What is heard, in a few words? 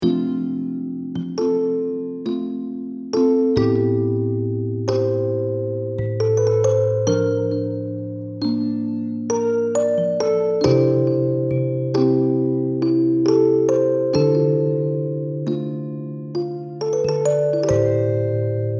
Healing Melody